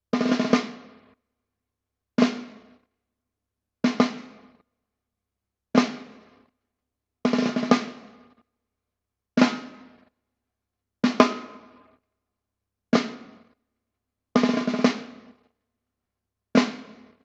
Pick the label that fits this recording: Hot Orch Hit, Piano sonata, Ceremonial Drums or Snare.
Snare